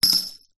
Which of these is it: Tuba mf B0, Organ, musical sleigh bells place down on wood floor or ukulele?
musical sleigh bells place down on wood floor